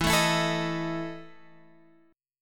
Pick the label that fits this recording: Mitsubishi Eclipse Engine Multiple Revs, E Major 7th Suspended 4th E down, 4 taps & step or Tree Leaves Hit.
E Major 7th Suspended 4th E down